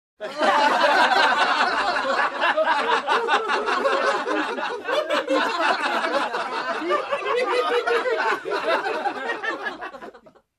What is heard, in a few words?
Gathered People Laughing together